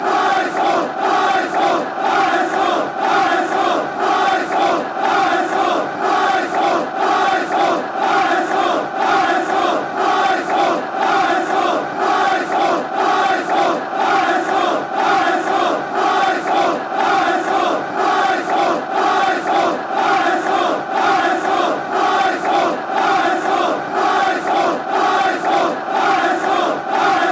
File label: fan chants; hsv